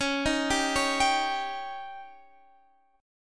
C sharp Suspended 2nd Flat 5th C sharp Guitar Standard; arpeggiated; JGuitar